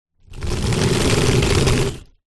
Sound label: suitcase pull wheels concrete short; foley; zapsplat